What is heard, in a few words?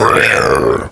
speed hit